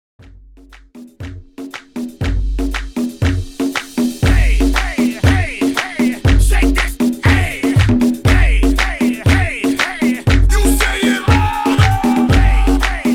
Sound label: PARTY BREAK 119 BPM